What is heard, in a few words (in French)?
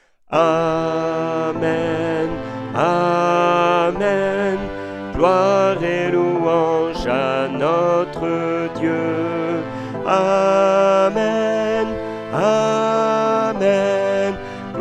DOXOLOGIE solennelle ALTO